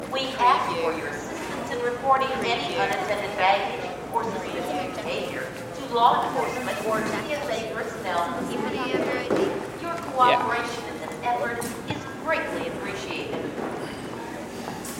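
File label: Airport Security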